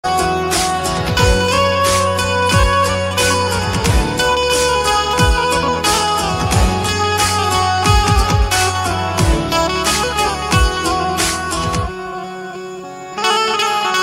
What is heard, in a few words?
benjo ringtone; mahadev ringtone